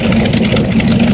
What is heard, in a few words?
tank idle; 1997 MMX LAN PACK (win 95); Army Men